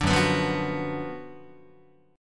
Gb Major 7th Suspended 4th Sharp 5th, down, Guitar; strummed; JGuitar